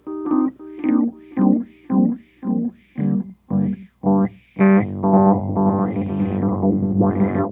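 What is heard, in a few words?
RHODES FUZZ; PlatinumPhattCD2; E-MU Producer Series Vol. 8 – Platinum Phatt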